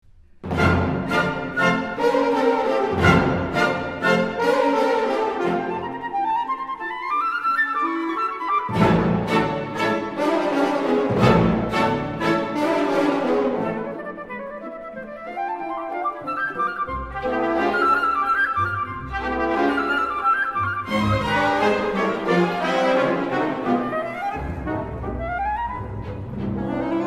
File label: Flute Concerto